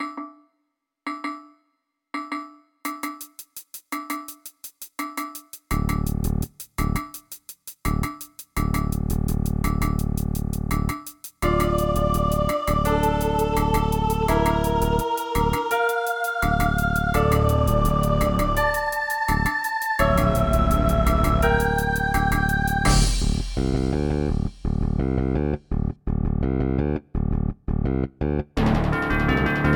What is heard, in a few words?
Ambient; Dune 2